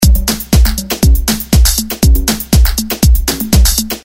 rhythm loop palm kik rithm; Sound-Graph

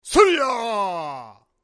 saya voice sound effect karate figth manga; videogame; Sound-Graph

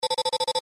Electronic system sistema electronico; Sound Graph

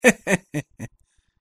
risa forzada Forced laugh

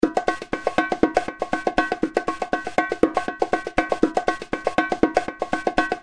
rhythm loop RassTech

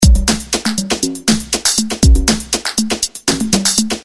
rhythm loop palm kik rithm; Sound Graph

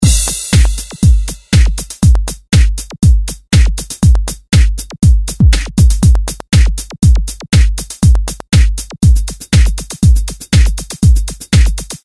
rhythm industrial; Sound Graph

